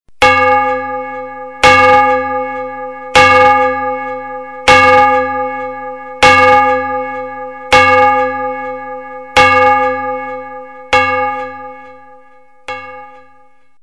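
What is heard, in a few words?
sound of a bell; ringtone; zvuk kolokola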